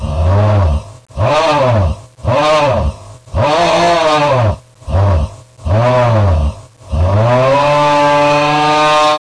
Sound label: augh